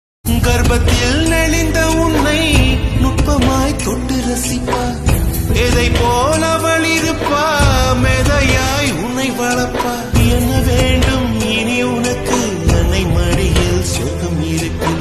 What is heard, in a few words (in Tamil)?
amma song tamil